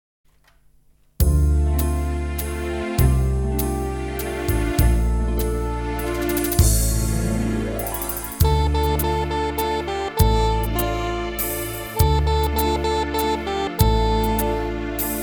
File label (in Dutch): lied De kerstgedachte karaoke; lied van de maand